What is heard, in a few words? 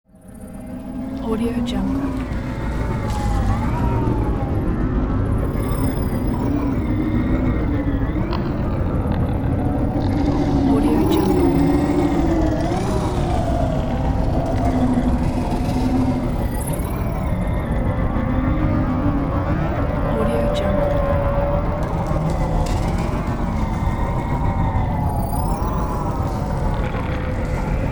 scifi ambience